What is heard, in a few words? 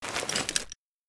Backpack Open; Metro Exodus